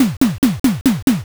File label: rush